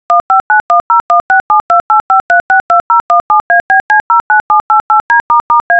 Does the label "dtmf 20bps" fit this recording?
Yes